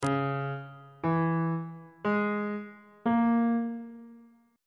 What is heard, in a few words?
d flat minor 6th chord intervals